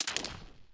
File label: death candy drop; smite; chaac